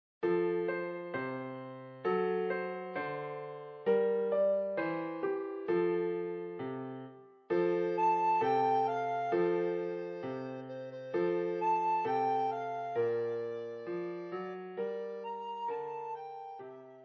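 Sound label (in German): Jingle Bells Blockflöte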